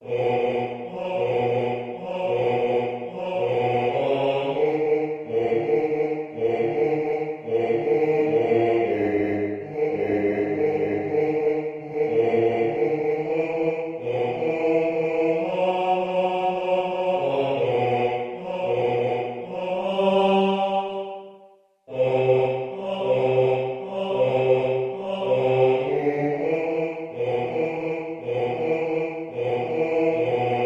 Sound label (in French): basse